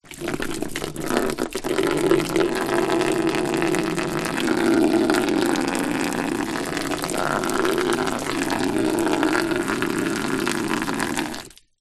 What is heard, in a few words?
foley water pour onto cardboard